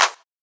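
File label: Clap; Bodacious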